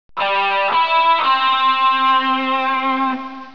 GUITAR; NBC

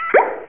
POP; WBROS

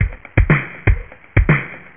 BEAT

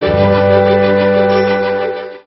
STRINGS; QUICKEN

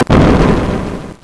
EXPLODE; WNET

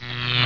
BUZZ

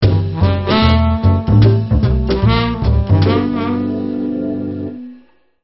JAZZ; QUICKEN

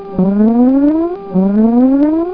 WARNING

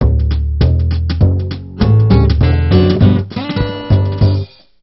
COUNTRY; QUICKEN

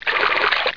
WATER; WBROS; PLAYDOM

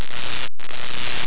LASER

